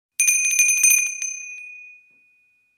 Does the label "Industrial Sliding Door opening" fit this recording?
No